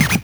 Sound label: Record Scratch; Genesis